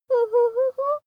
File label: child laugh fake